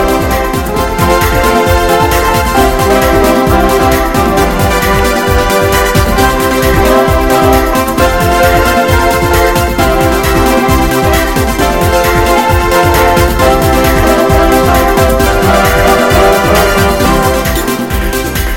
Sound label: Hot and Quick